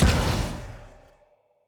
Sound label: enemy summon spawn